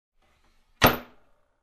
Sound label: Wooden Door close